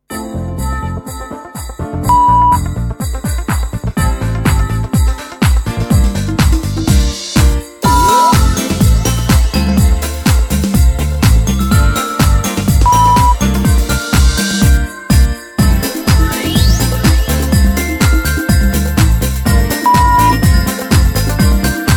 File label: XMas UK Pop 124